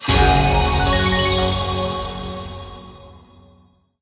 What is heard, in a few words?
medium Win; fireflies